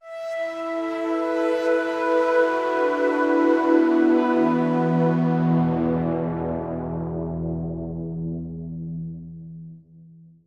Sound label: Synth E Long